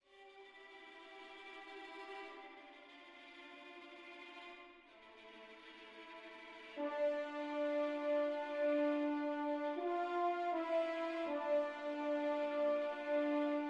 Variazione quarta; moods

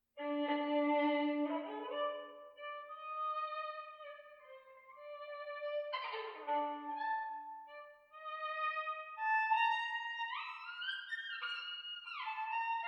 improvvisazione; 15 studi capricci

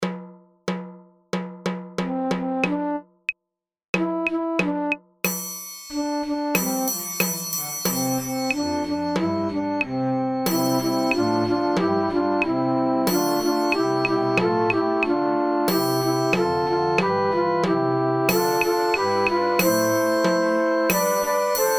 Muovendo il ritmo, flauto dolce; impariamo divertendoci col flauto dolce

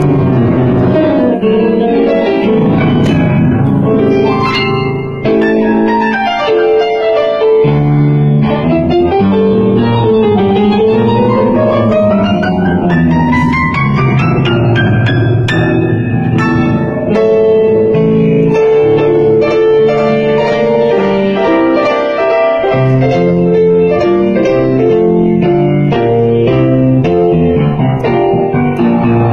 PRESTO AGITATO CALMO; l arte dell improvvisazione ritmo e contrattempo

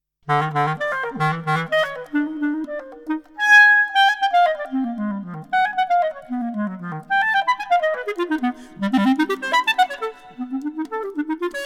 Vigoroso; clarinet compositions; Quattro Pezzi

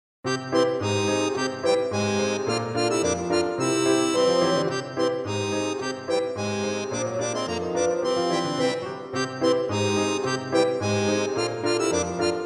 Basson; Saltimbanco (Trio Accordion - Piano - Basson); mini suite

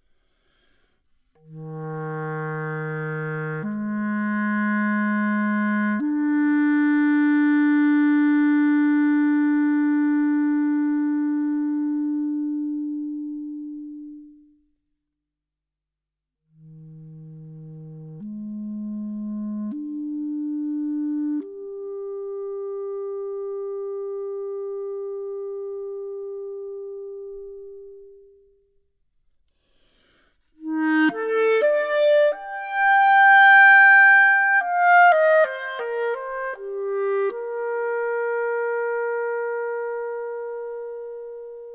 Andante con libertà; clarinet compositions; Quattro Pezzi

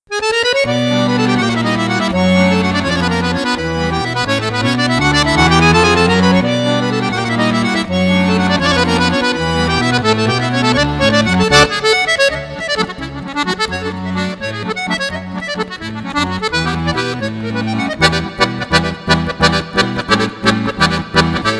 Improvvisazione musette; virtuosismi ruffolo; Waltz swing; VIRTUOSISMI (for accordion)

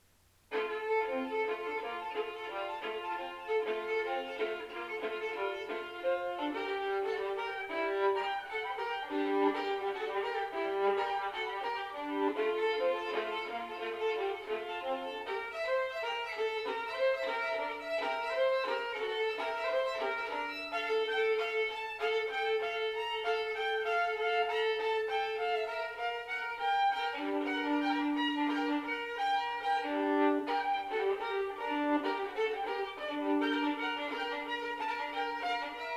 l organo; L'organo (Studio in Fa); 15 studi capricci

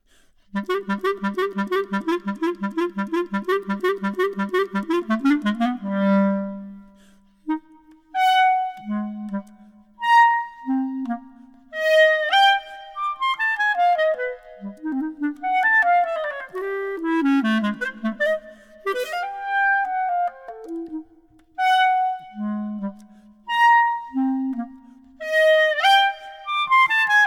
Allegro; clarinet compositions; Quattro Pezzi

